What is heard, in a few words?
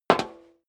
step high heels metal rattle single left; schritte